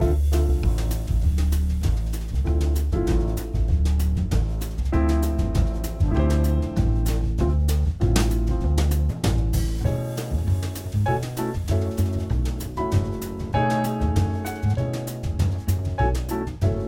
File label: modal; theorie